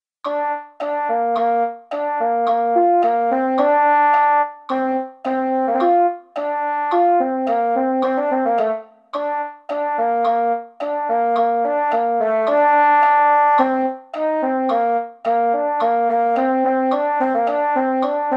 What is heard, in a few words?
mellophone, brass; holiday brass